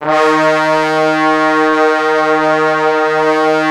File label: D#2, Orchestral Brass; Best Service ProSamples vol.20; AKAI